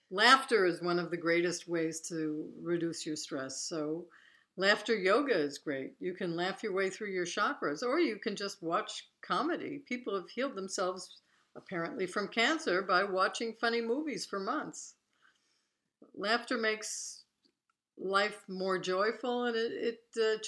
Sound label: Laughter Exercise; Seven Abundance Hacks